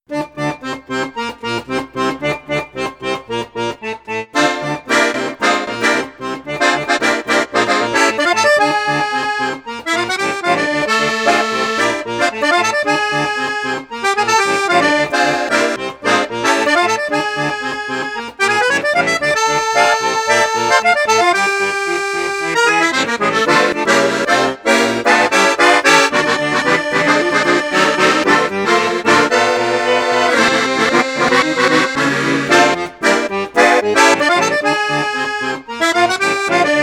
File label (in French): registre basson flute juste flute haute flute basse basso ostinato, accordeon; perle d'or